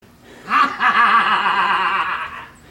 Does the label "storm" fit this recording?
No